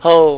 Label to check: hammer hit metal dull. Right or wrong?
Wrong